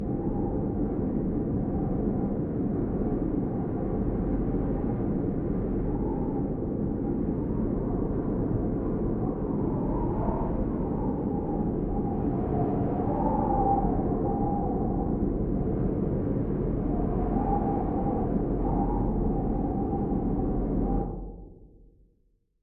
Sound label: Secret Passage